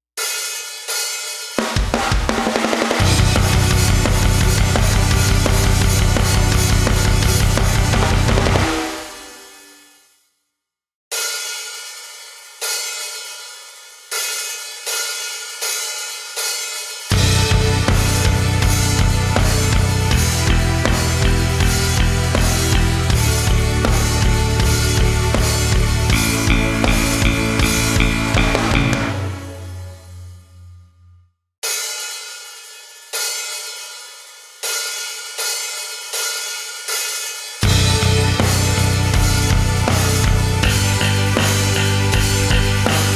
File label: karaoke